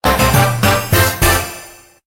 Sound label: WIN MEDIUM; Ultra Sevens